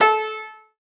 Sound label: A piano